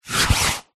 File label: hospital blood pressure machine arm band place on arm